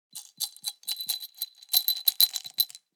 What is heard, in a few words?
Pouring pills into hand glass vitamin bottle; medicine